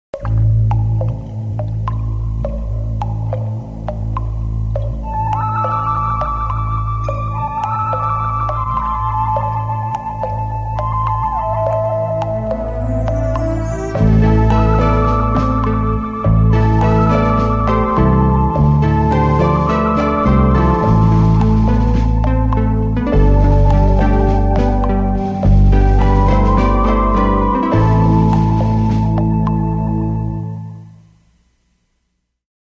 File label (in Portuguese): Trilha Zen; IpanemaFM; Spots Agencia Jinga